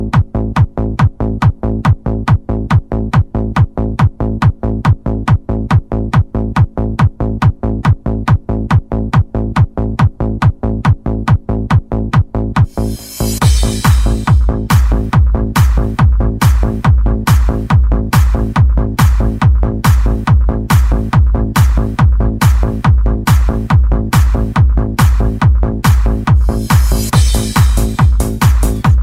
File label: bass